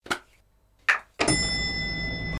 windows ding

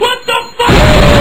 wtf boooom

